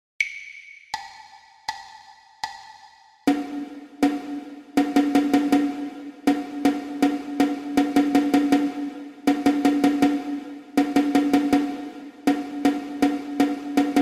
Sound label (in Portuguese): percepcao ditado ritmico